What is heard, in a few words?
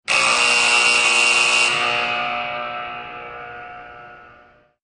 Classic Warning Buzzer